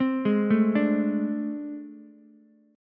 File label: A Minor Major 7th Double Flat 5th, down, Ukulele; arpeggiated; JGuitar